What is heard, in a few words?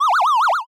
kusuguru